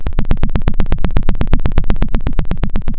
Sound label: pop, Sound of a helicopter